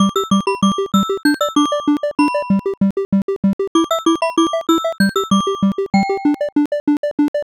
bgm

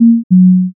error

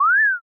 refresh

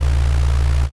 vibrate

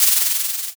pen

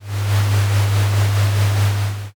conveyor

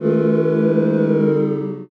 commotion

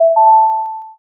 m notify

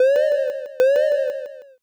warning light

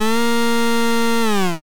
air horn

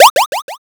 popup

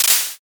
clap

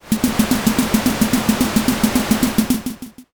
carriage

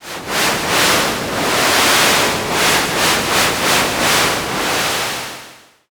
river

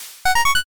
line clear

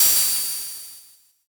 cymbal

